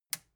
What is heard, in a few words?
metal button release power on